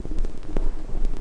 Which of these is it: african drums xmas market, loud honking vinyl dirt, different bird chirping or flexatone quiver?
loud honking vinyl dirt